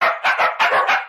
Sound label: Corgi barking